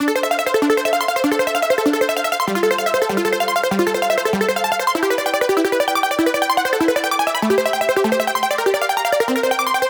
arpeggio synth

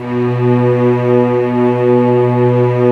STR, Vcs p Amb

